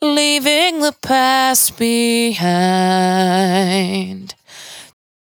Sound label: 92bpm Bb, Velvet Vocal; Zero-G